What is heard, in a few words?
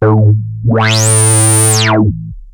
OSCAR A2, synthesizer; Hollywood Edge - Giorgio Moroder Rare Synthesizer Collection